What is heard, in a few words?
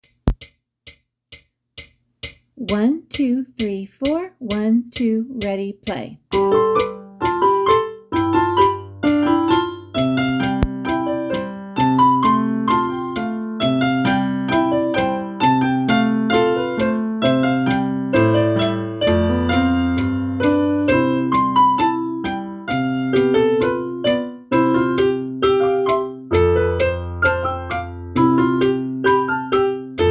Duet